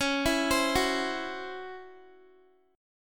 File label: C sharp Minor Major 7th Double Flat 5th Guitar; C#mM7bb5 arpeggiated